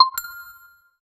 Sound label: game ui confirm; level up